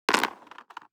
DICE on Cardboard, Throw and Roll, Standard, 6 Six Dice; Samples; ArticulatedSounds; DICE Sfx Party (Extended)